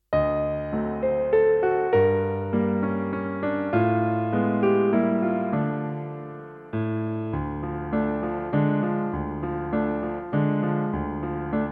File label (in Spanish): Danza armenia (tempo lento), violín; STRADIVARI VOL. III